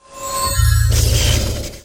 knock

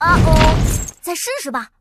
hit

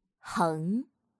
stroke

